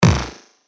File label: PERC A Vault, percussion; revealed percussion vol 2